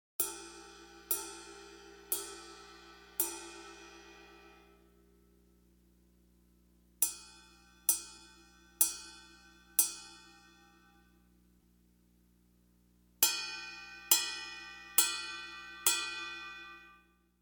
ride cymbal; Drums

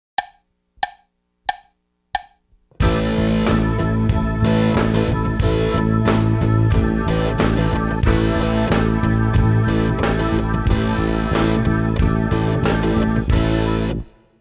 nimble limbs, drums

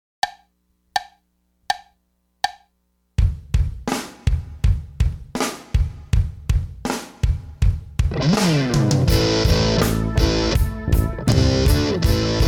flam this, drums

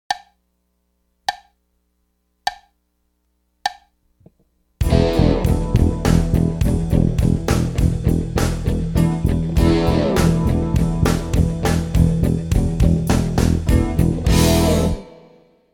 mixed up, drums

